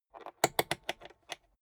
rotary control short turn

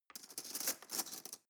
cutlery teaspoon drawer handle

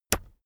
keyboard external space push heavy

